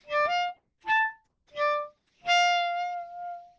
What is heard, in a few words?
clar